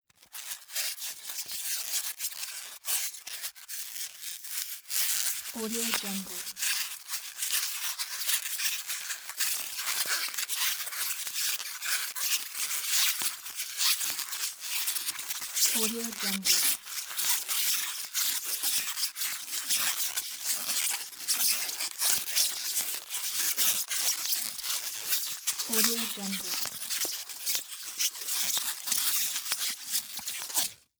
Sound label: styrofoam continuous squeaks